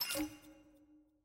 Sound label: team channel disconnect; premade voice